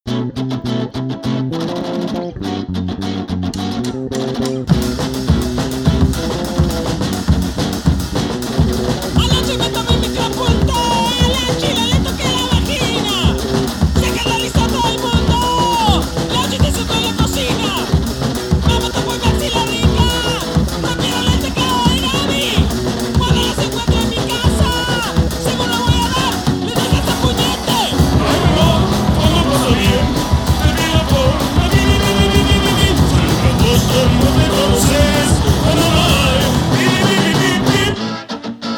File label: Salsa